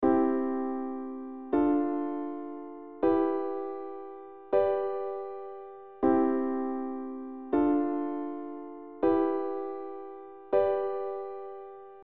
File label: I IIm IIIm V